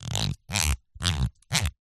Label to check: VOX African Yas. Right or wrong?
Wrong